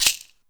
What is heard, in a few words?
Shaker